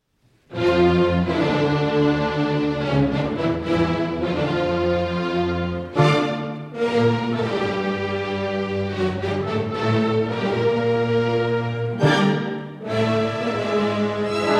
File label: Cello Concerto in D Minor, Prélude. Lento - Allegro Maestoso (Live); Lalo